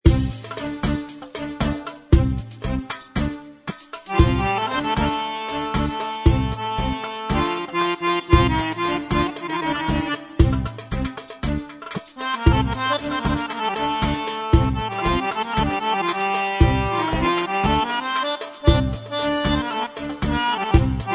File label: genesys pro oriental